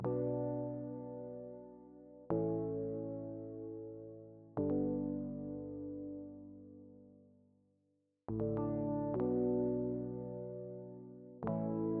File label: rhodes